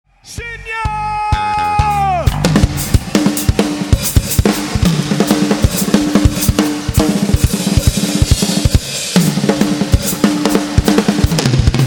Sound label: Drum solo